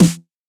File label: Heavy Snare